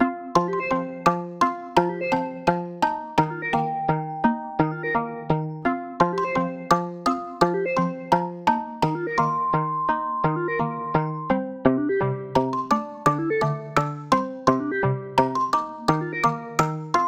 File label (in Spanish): musica menu; Emojiji